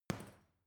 boxing punching bag side hook soft; sport freizeit; boxen

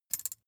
thin metal boing vibrate short; industrie wirtschaft; metall

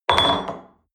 espresso upset table medium, restaurant cafe

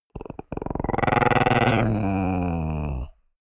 chihuahua growl heavy; natur-tiere; hunde

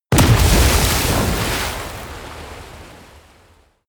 explosion medium water, comedy cartoon; soundpool

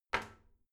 toilet seat close soft, badezimmer; haus-garten

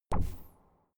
space laser gun bubble shot hard; soundpool

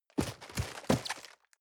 cardboard box medium turn hard short; objekte

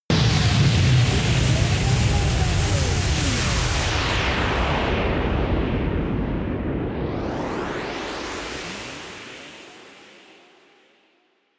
storm vortex, drones

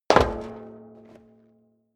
step dress boots metal rattle jump; schritte; Footsteps; menschen

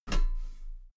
step metal ladder step top soft; schritte

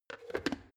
plastic water boiler lid on; soundpool

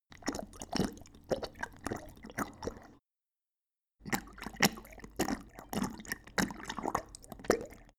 dog drink calm; natur-tiere; hunde

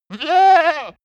goat baa

